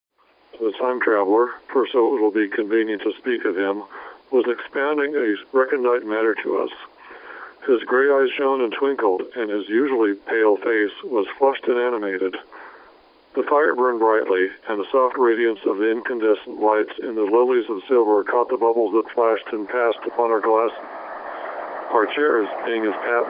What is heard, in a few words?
time machine generator